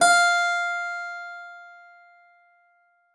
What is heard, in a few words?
SPIN E5, SPINET; Xsample VOL-7